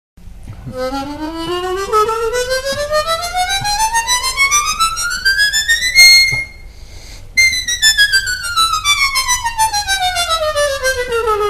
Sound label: chromatic